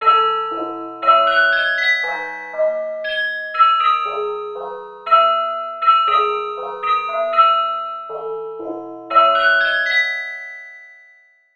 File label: waltz